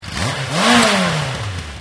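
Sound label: accel orig, Yamaha XJR exhaust; akrapovic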